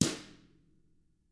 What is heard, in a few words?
TAMA; ILIO; Double Platinum Drums 1